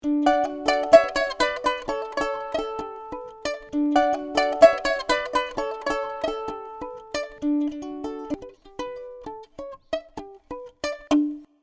son Ukulele

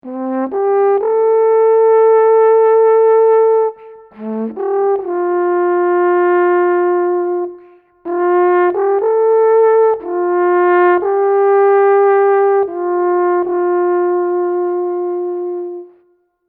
son Alphorn melodie

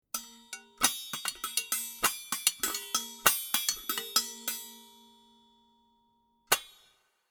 son charleston brico, Batterie

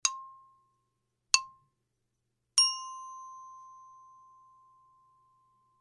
Son lithophone xylophone metallophone

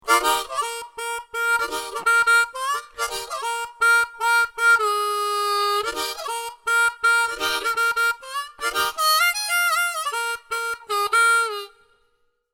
son harmonica

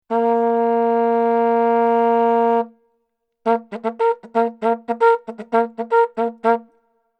son Vuvuzela; Trompette; Duperrex

